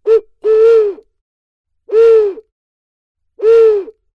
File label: Owl